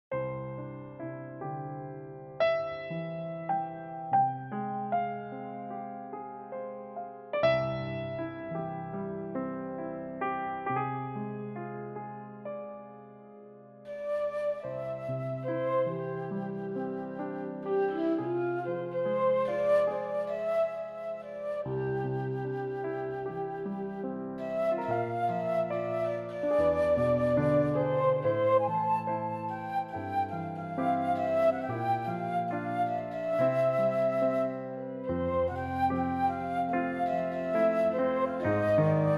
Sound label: C play referencia flute